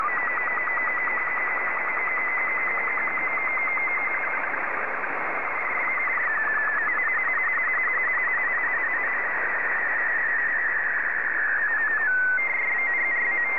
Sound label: sstv; R12BW